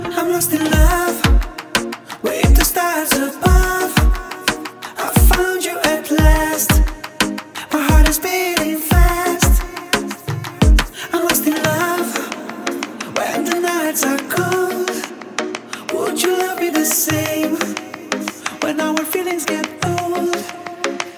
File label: English Song Ringtone